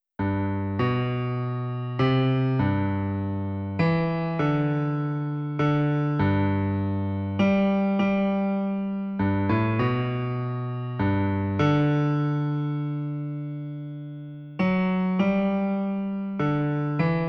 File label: Bass